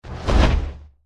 deathclaw swing; npc

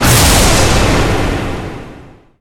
explode

